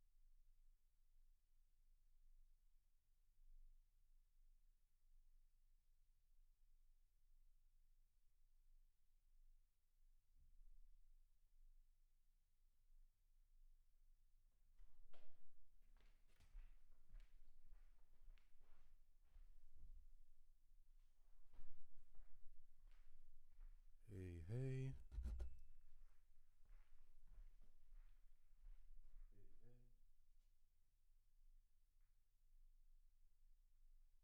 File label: MAIN VOC